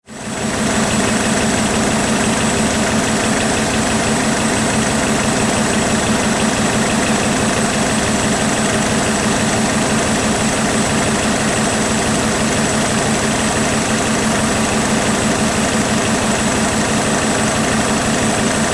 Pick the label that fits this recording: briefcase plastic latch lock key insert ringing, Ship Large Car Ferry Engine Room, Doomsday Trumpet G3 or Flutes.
Ship Large Car Ferry Engine Room